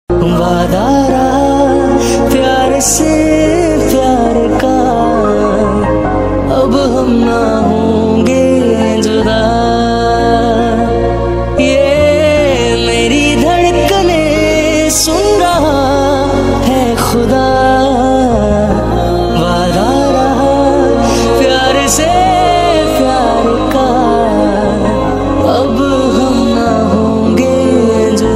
slow hindi ringtone

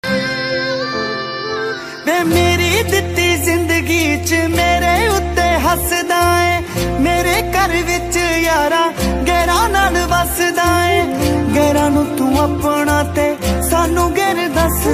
ringtone

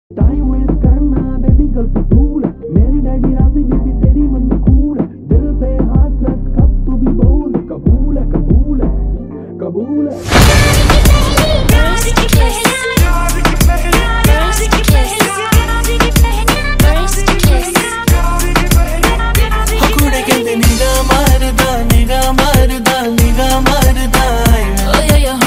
slow reverb hindi ringtone

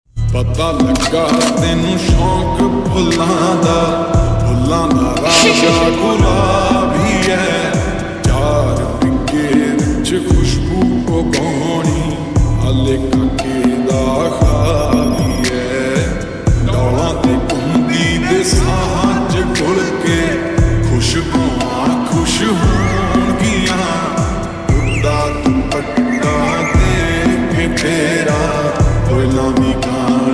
slow reverb ringtone

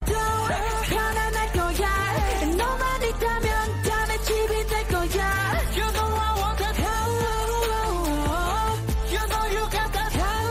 korean ringtone

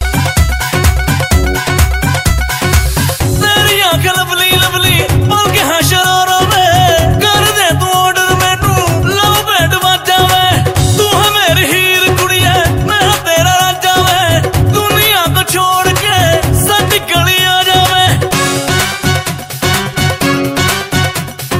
punjabi ringtone

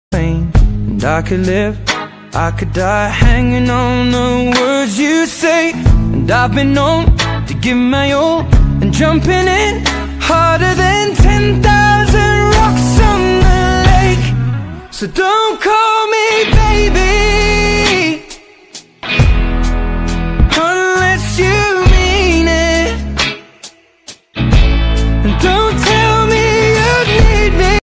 english ringtone

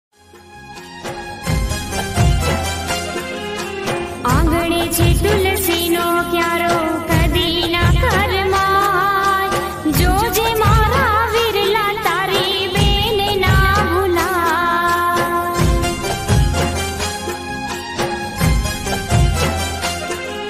gujarati ringtone

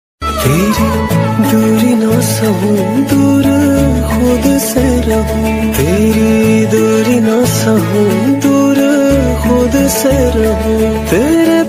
male version hindi ringtone

